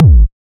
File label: tr 505 synthetic kick drum A key, Royality free tr 505 kick sample tuned to the A note; kick drum samples